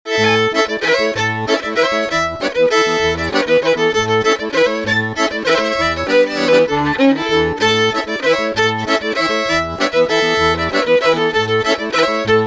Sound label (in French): bourrées; Orage